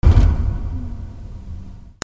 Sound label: CARS